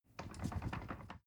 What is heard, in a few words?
ladder wood rattling